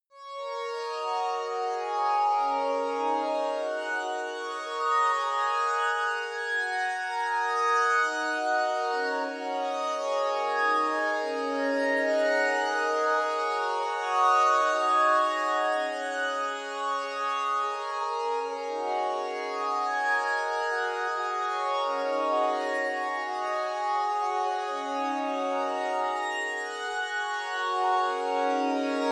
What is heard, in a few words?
diffuse asian strings, Pads